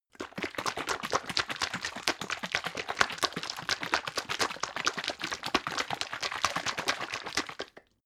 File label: bottle syrup shake long; berufe; gastronomie